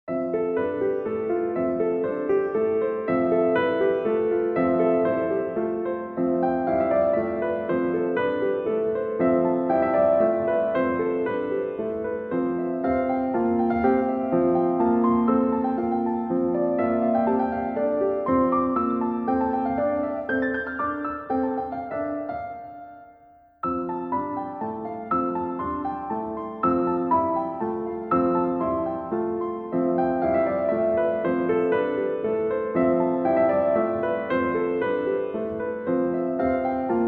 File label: Piano Miniatures - II. Journeys